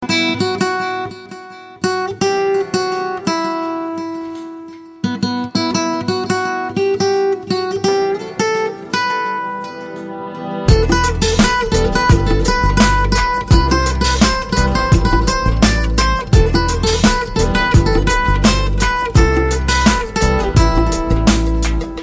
Romantic Guitar; Valentine Day Special (2015); Valentine Tones

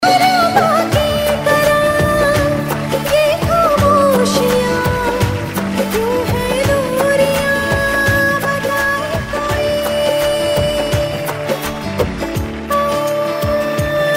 Female; Zee TV; Kumkum Bhagya

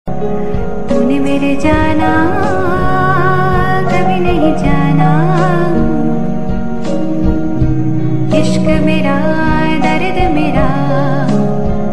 Female Ringtone

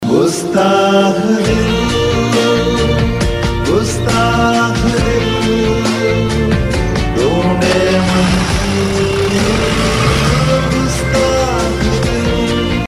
Male Version; TV Serial Tone; Life OK; Gustakh Dil

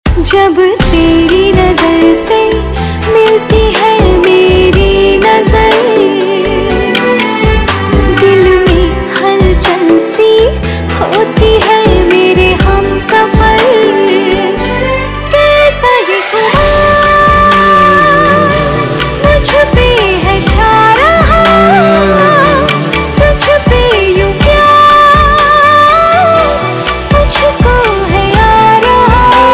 Love Song Female

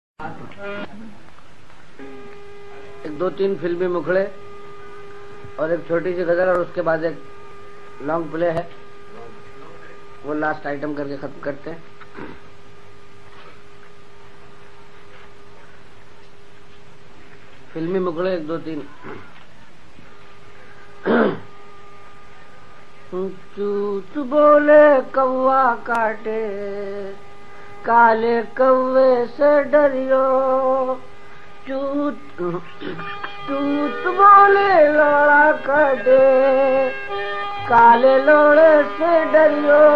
Urdu Qawwali; Whatsapp Audios

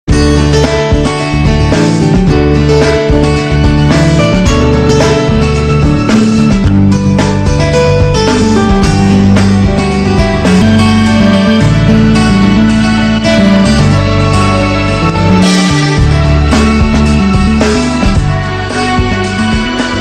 College Tone

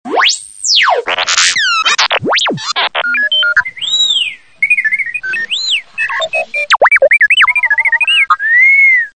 r2 d2

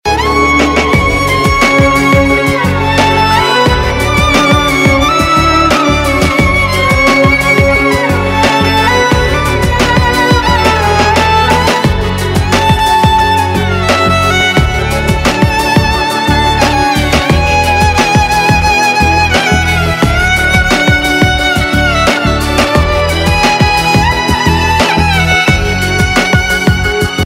Instrumental Violin Ringtone

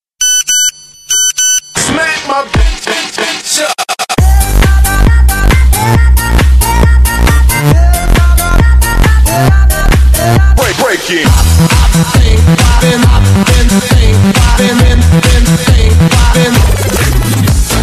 Nokia Digital; Ringtones

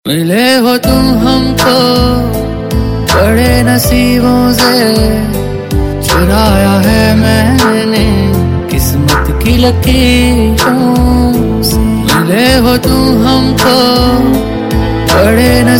Ringtone

